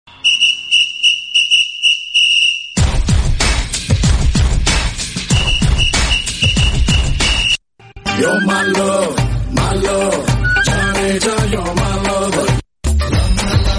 mix songs

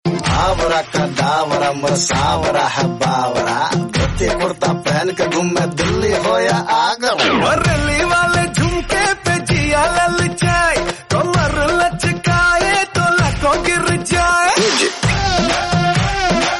bollywood hindis, ringtone

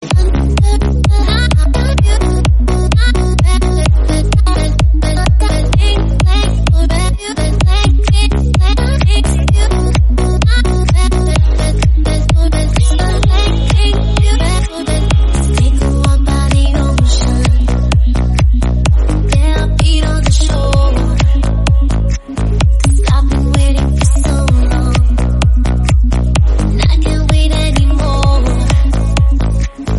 latin ringtone

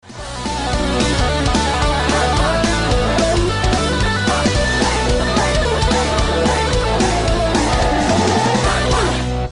guitar

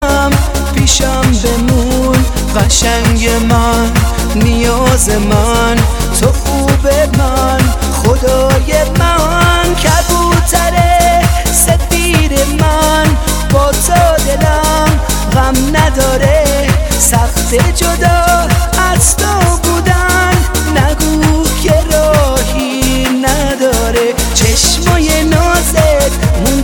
latest arabic ringtone

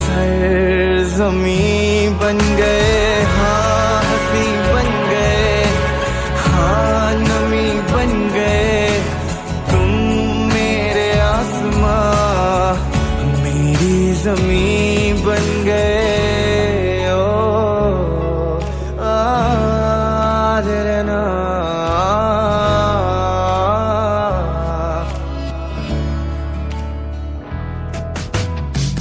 romantic ringtone

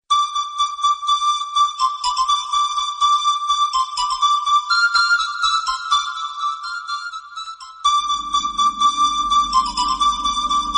flute, ringtone

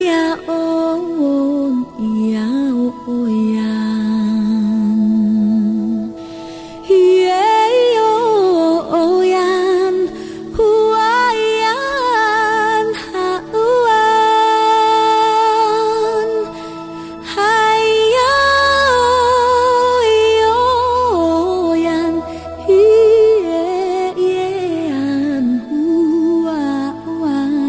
taiwanese ringtone

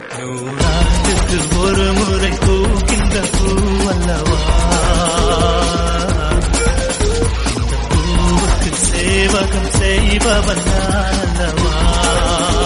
nooranduku remix

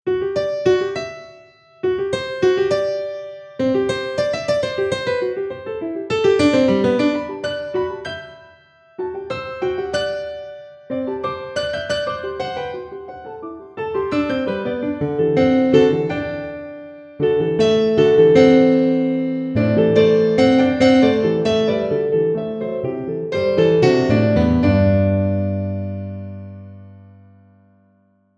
counterpoint

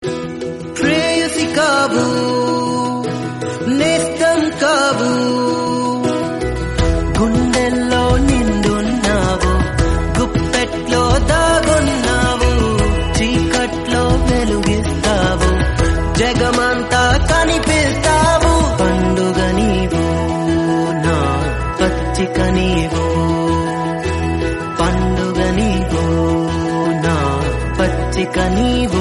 telugu love

